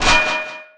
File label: Pickup Armour Shield; Pickups